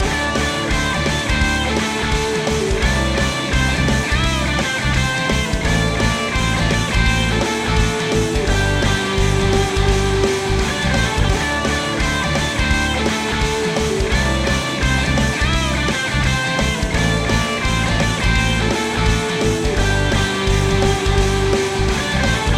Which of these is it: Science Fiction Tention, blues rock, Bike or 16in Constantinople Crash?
blues rock